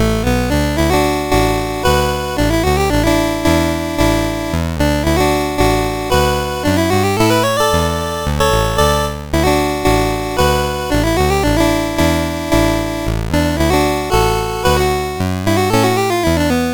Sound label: Just before the News, CSG8580R5